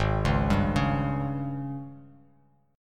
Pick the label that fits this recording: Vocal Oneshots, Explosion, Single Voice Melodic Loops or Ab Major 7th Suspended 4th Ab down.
Ab Major 7th Suspended 4th Ab down